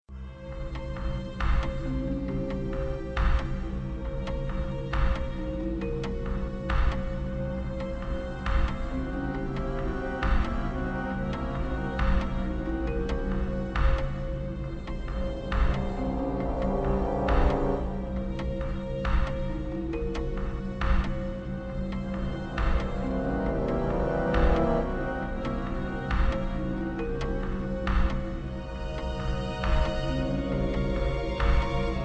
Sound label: Mars Dunes (Ambient)